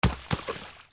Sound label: WATER